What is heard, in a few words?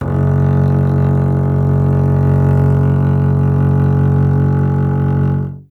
STR CBS, Solo Instruments; Cbas; Miroslav Vitous - Solo Instruments VOL-2